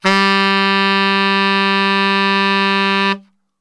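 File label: G 1X SAX STR, saxophone; Best Service; Brass Super Section; SAX STRGHT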